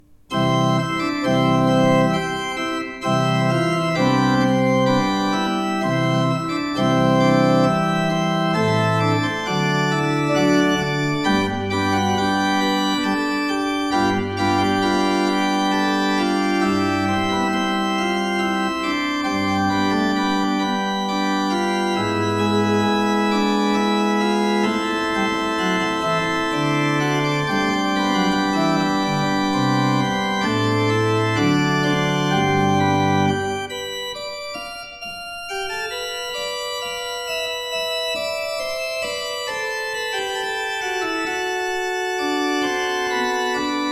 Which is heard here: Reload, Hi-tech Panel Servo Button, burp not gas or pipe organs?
pipe organs